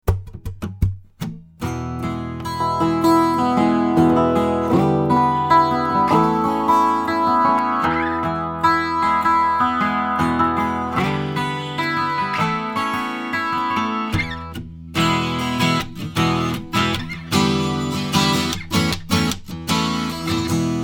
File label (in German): Taylor12 Oberes Band Boost Und Cut